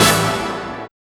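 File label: big stab; X-Static Goldmine 1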